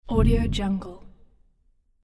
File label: low neutral hover